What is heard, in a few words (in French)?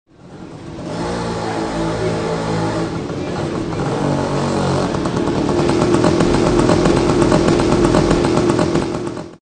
Scooter